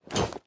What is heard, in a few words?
equip iron, armor; Minecraft